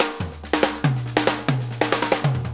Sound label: Shuffle Fill; Drum Tools